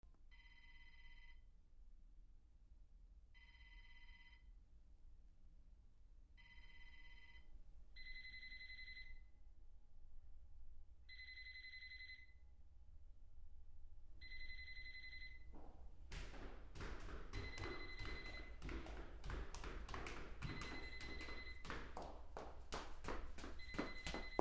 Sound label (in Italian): TELEFONO CELLULARE; phone